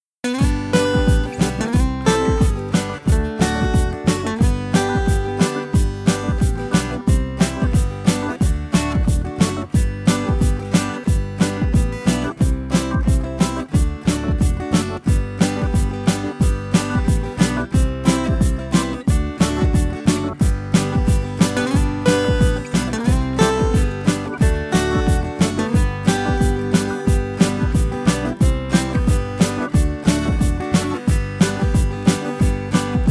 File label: Instrumental